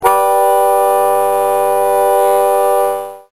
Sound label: harmonica